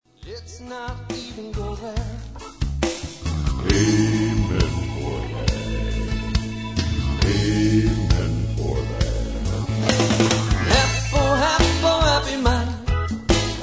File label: happy song